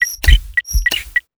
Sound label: adrenaline low loop 3d